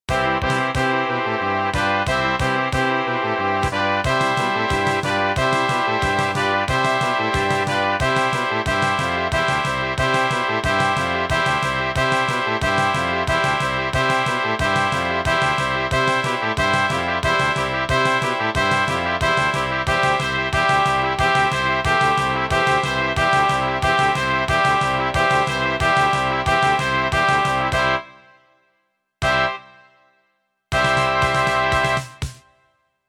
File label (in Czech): Fanfary